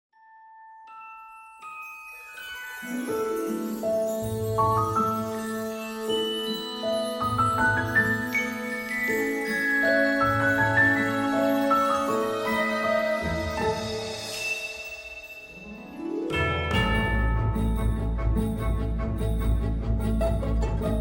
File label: Magical Tree